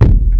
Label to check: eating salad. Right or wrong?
Wrong